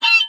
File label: staff slam; Wizard Mini